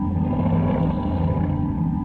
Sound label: gate idle, monster